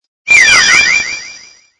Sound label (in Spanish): Grito; Hattrem; Gritos de Pokémon de la octava generación